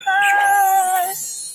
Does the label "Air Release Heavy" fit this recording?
No